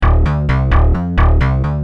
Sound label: Rage, Bass; Industrial